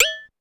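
Cowbell Up Zap, Drum Machines Clavia DDrum-AT Pitch Envelope; 300 Drum Machines; Pitch Env Various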